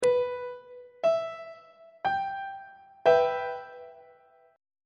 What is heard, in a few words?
e minor 2nd inversion on treble clef